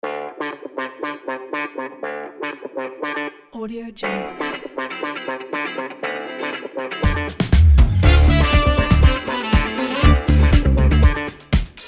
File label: the 70s funk